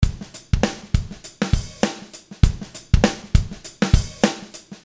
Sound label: SSL G series medium; Liquid Mix